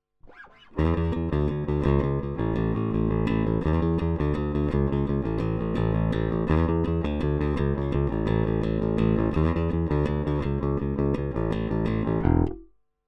E Bass direkt

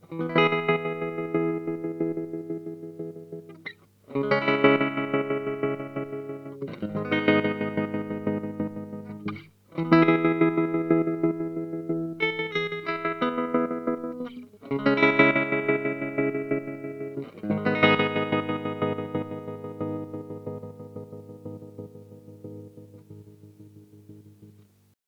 Boss SL-2 Attack und Duty auf 12 Uhr Single

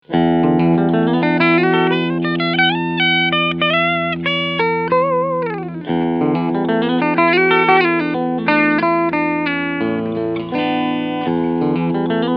Cleansound Steg PU

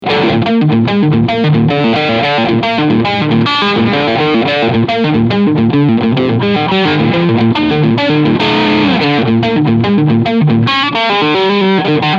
Steg PU Overdrive

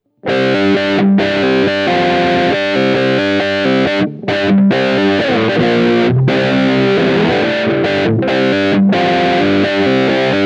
Schecter C 1 Exotic Beide Humbucker Drive Ton 0

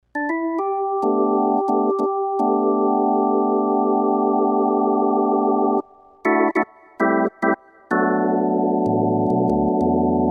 Numa Compact 2x Orgel

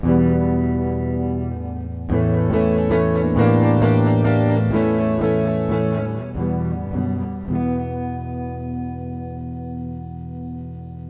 iZotope Spire Studio E Gitarre mit Pedaleffekt Big Air

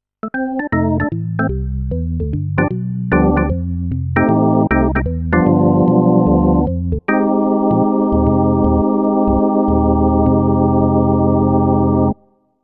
Orgel; Roland FP-10

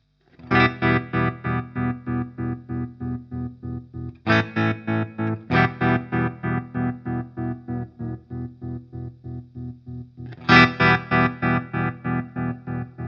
Walrus Audio Monument rechteck

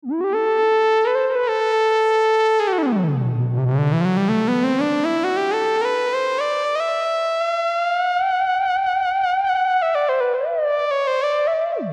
Melodie

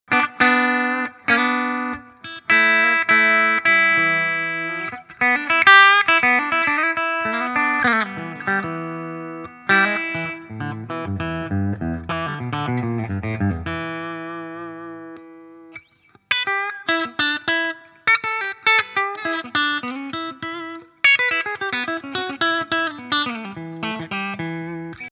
Harley Benton HP 42 Humbucker Steg Singlecoil Steg

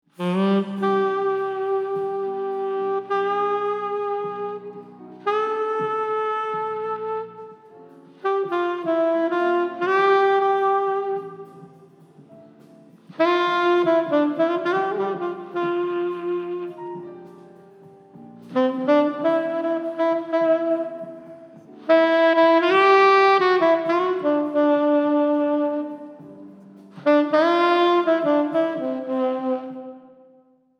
Tenorsax EMT250

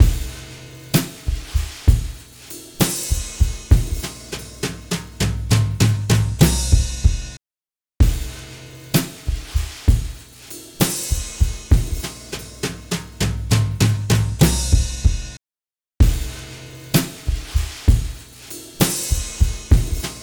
DRUMS bypass in volle sättigung

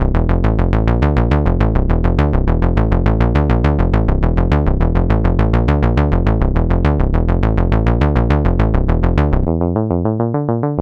Plucked Basses Auswahl; Gforce Oddity 3

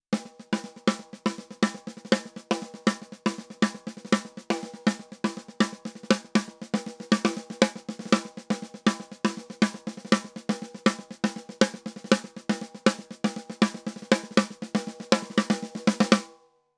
SSL 611 E DYN SD ohne E DYN

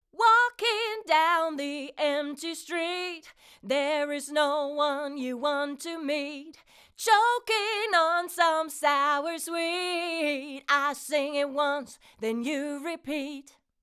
Vocal ohne Comp 554; Golden Age Project; MK II

